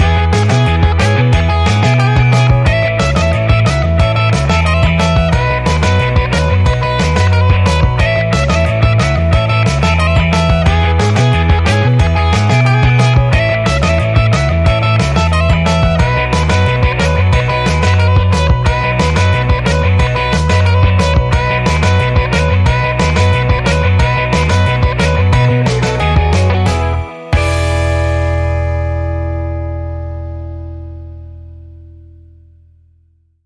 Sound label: Upbeat Boogie Woogie Rock and Roll Music